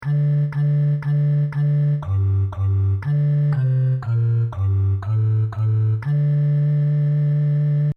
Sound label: If today you hear his voice Bass; Psalms